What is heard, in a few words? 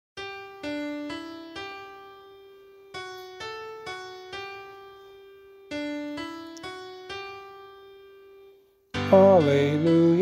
Gospel vocal